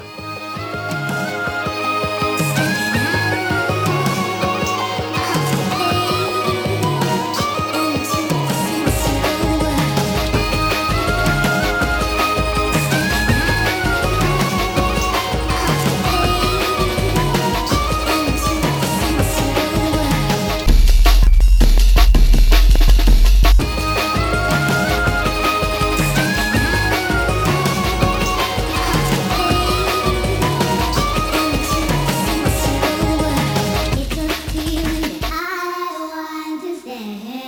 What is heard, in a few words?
breakmix; MED; Flanger